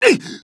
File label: damage; dialogue; mohaa